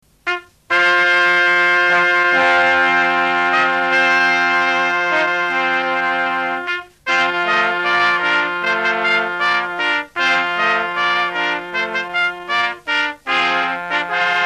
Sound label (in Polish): Hejnal; Kielce